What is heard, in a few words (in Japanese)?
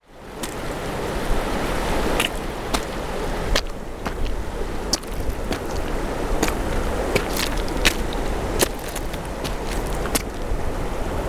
川辺を歩く音